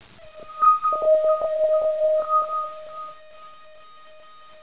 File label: high tone